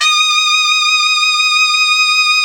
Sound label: MIZMAR; World Combo